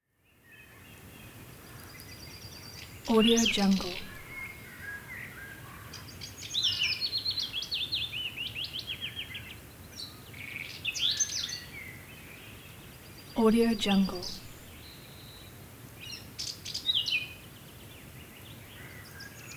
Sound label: morning ambience